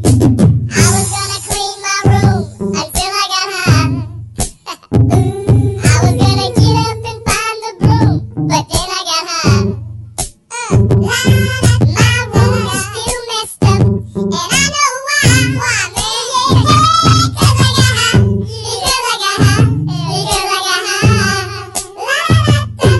chipmunks

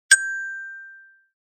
HTC 10 Sense 8 Sound Suite Stock Notification; Linseed

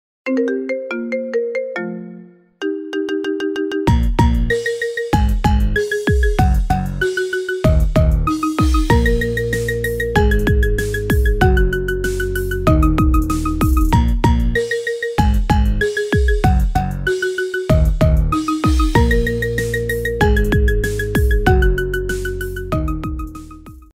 marimba remix

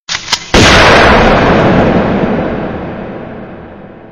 Gunshot

